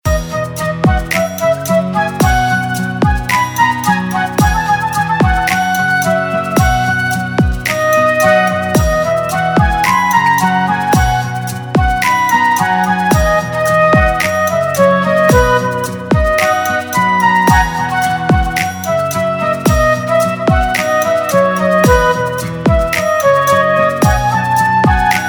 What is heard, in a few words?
Instrumental Ringtone; Bollywood Hits (2014)